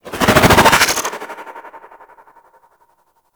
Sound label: Psychic Bolts Target B; Warframe; Nyx